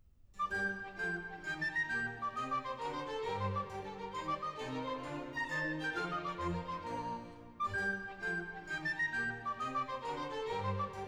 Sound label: Water Music Suite in G Major; Handel; Fireworks Music & Water Music